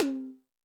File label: Toms 1, drum machine